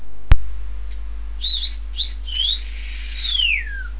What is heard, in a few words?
wolf